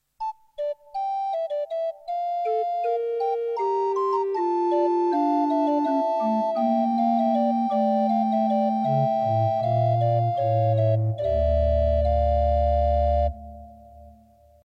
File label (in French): rec bourdon, orgue; h4